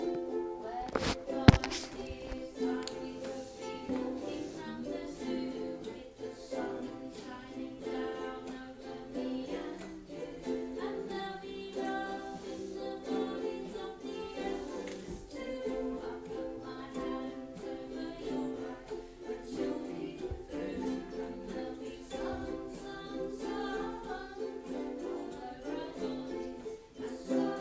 ukulele

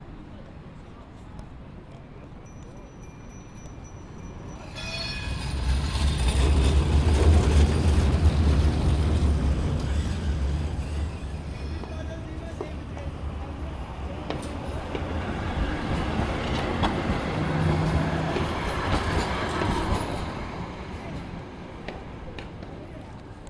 vienna trams passing

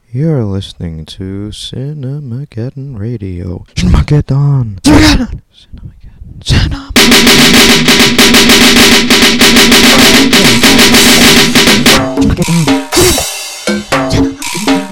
radio jingle

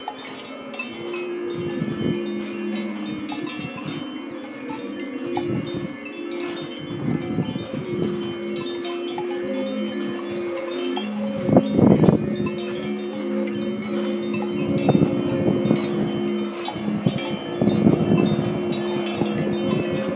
harmonic fields

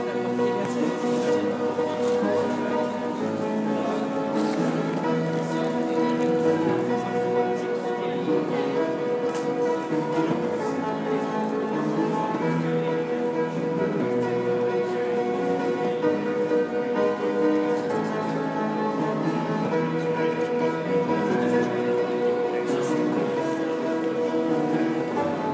public piano at st pancras

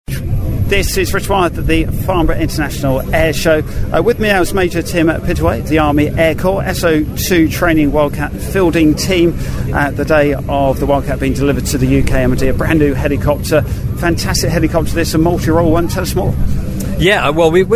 AW159 Wildcat helicopter

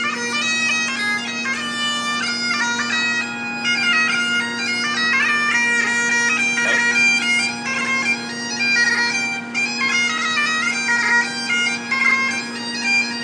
bagpipes

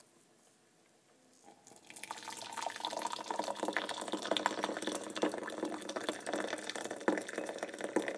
pour

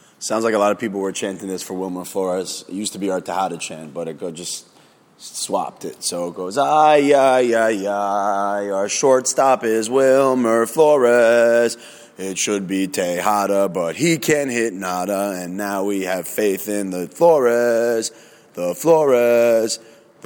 chant; wilmer flores

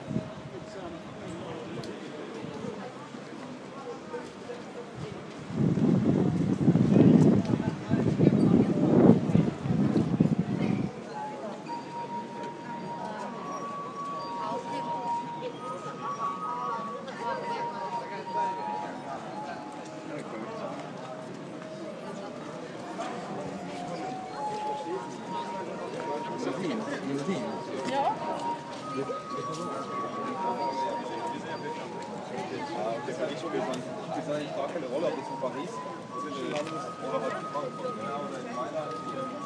glass rim player; stockholms gamla stan district

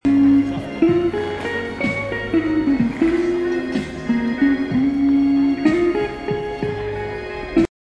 random busker new street

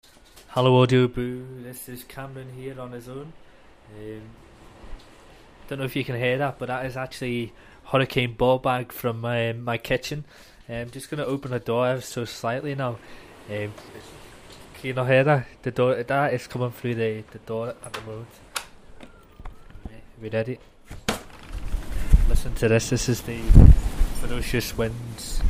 hurricane bawbag scotstorm

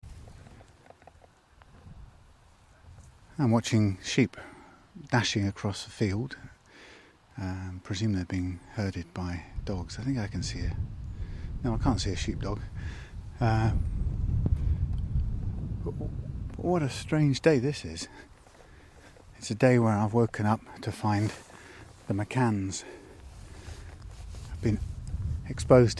stream walk

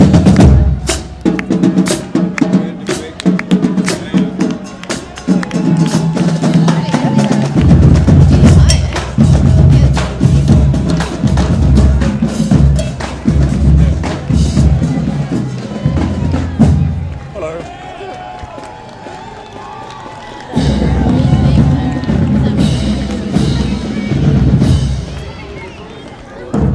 marching band

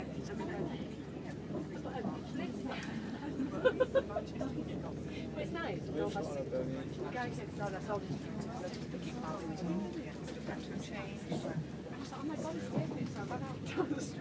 carriage c just north of york

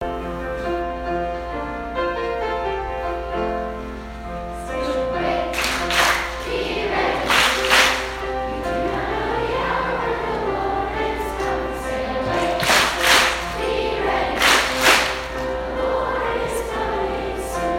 sung by school; smmschoolomaha; advent

